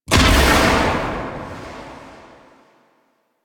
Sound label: Blade Explosion; Warframe; Pax Duviricus